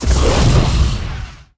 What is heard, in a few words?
Flame Thrower Shot Burst, Weapons